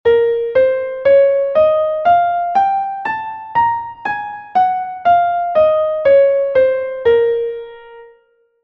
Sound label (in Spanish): si bemol menor melodica